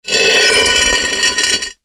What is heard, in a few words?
metal slide concrete